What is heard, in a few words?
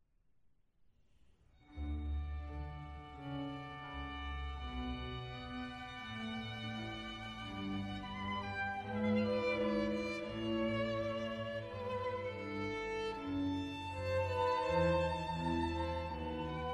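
Orchestral Suite in D major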